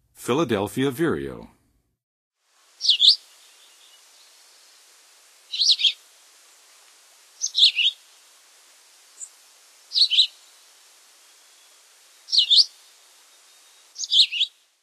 Philadelphia Vireo, Songs of Birds of North America; Western USA; Western USA Songbirds